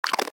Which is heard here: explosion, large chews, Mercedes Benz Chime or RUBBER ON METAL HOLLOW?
large chews